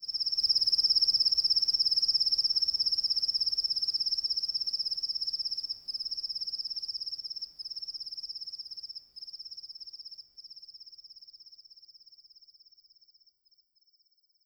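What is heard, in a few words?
Ahaggar Crickets right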